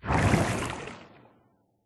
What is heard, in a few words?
upwards inside, bubble column; Minecraft; block